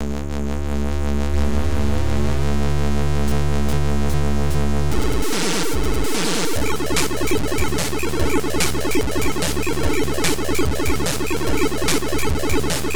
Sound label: RAVE TECHNO MIX